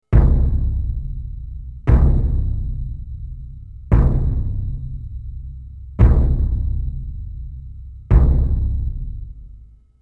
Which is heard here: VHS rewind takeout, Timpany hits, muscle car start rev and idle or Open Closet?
Timpany hits